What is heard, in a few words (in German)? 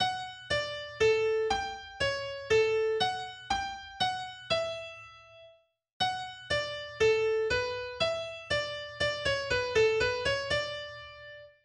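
Arietta Solo Akustikgitarre; Küffner; Melodie-Solos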